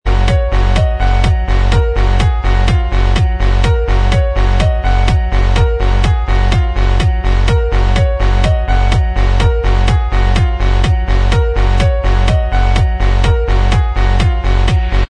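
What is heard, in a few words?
instrumental, backing track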